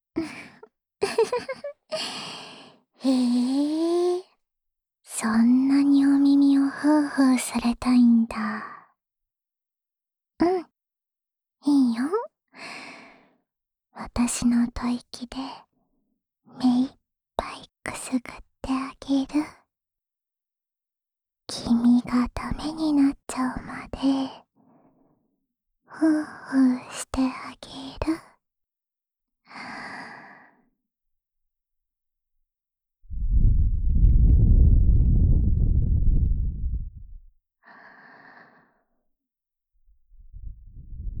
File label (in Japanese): 安眠用お耳ふーふーループ（右耳）, ASMR; 安眠用お耳ふーふーループ（右耳）